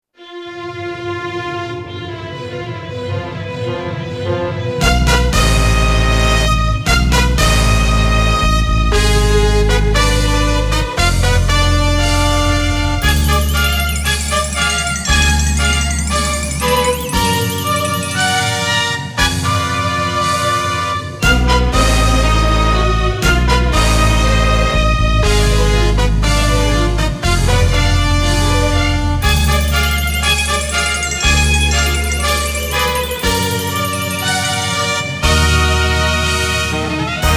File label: Synthesized Version; Dragon Ball Z: Ultimate Tenkaichi; PS3; Xbox 360; Homunkurusu Machi e… 2